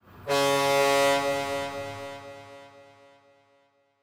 Solo Whistle; Yu-Gi-Oh Master Duel

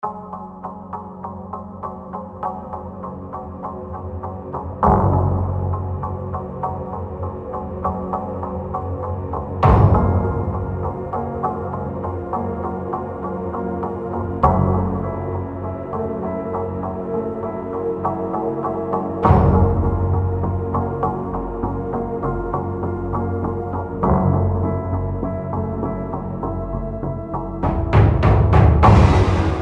Tension; Dead Effect 2

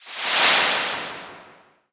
jingle equipment enhancement failed; sengoku taiga android ios mobile gamerip 2018

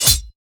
white spikes appear; Hollow Knight

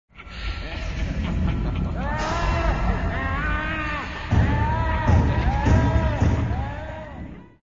insanity sound; the necronomicon

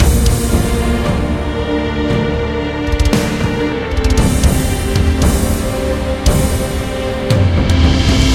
bgm loop; naruto ultimate storm android ios gamerip 2016; naruto battlefile bgm yanyincun

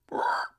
buzzer startle

